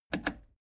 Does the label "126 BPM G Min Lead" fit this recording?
No